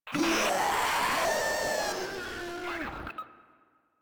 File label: Proto Infested Runner Vocal Attack; Warframe